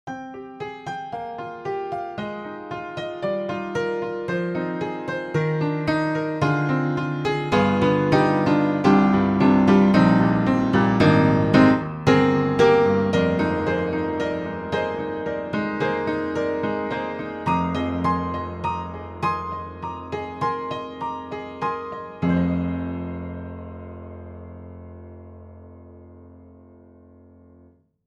Upright Pianos Vintage Upright Pop Vintage Upright; Synthogy; Ivory II